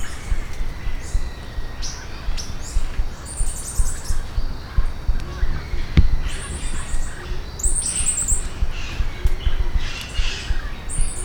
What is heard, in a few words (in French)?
ariane de linne, chant